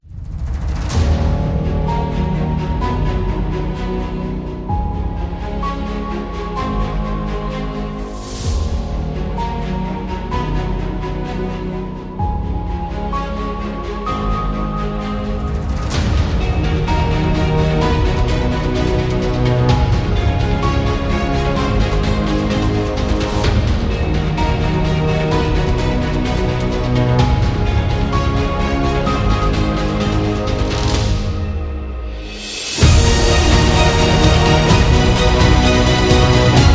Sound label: Epic Cinematic; self motivation